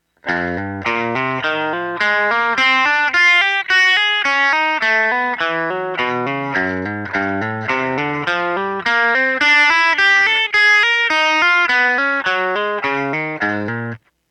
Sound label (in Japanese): ハンマリング練習